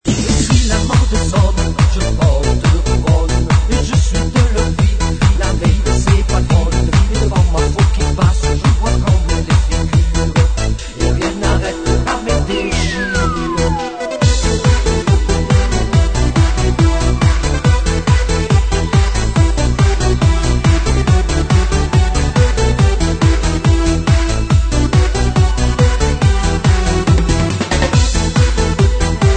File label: remix ballo